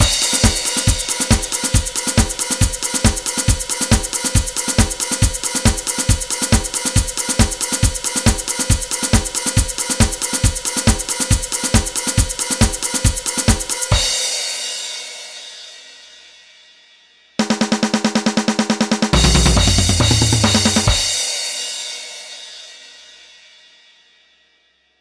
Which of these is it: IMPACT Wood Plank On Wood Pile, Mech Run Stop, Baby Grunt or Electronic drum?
Electronic drum